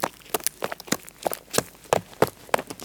Running Hard Surface

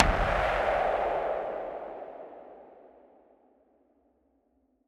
Tank Explosion

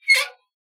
Squeaky Scrape

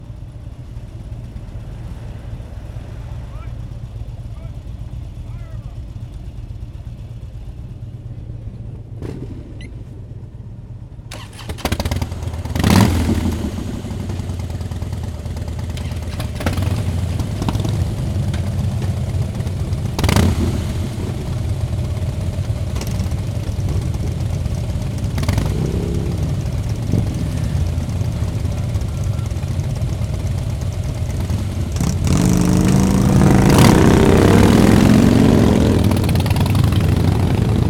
Harley Davidsons fire up

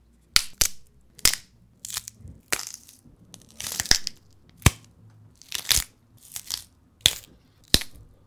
Bone Breaks Celery Snaps

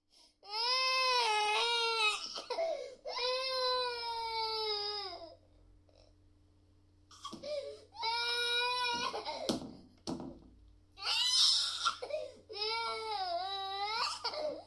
Baby Crying

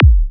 Club Kick

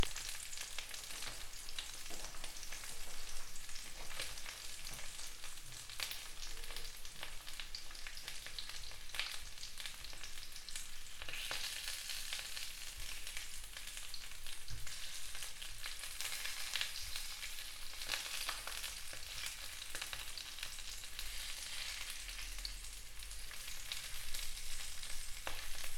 Frying Little Sausages In Pan

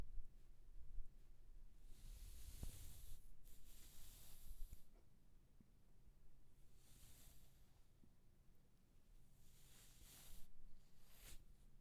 Brushing Hair

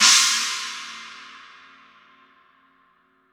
China Oriental Trash 18 High Vol

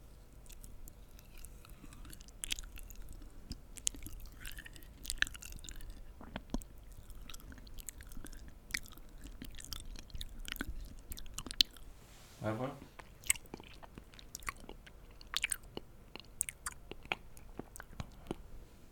Raspberry Chew Wet

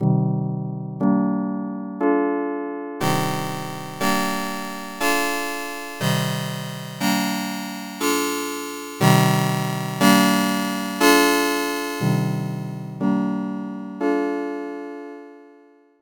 Slow March

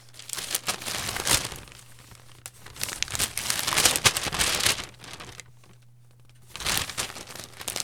Newspaper Reading Paper

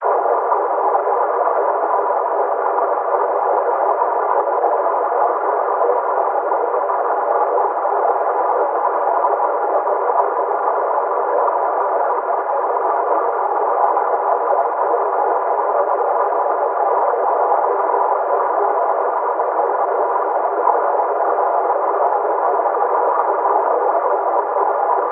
Passive Sonar

